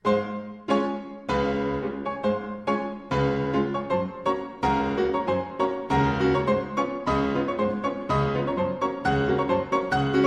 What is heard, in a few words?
Hungarian Rhapsody